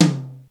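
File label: tom mid; xr10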